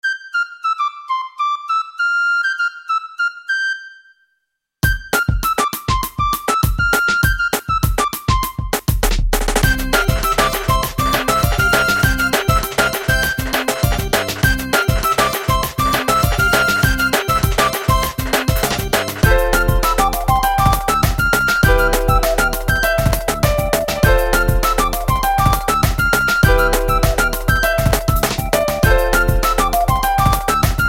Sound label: flute loop